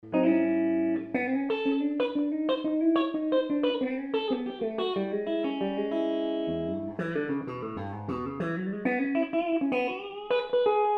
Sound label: middle, guitars